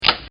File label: switch